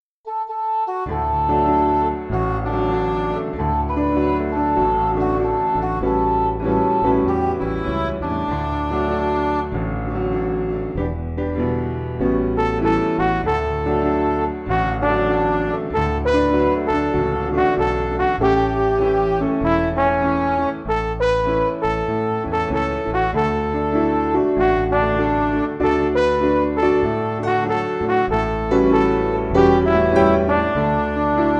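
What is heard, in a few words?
Music Backing Files for Churches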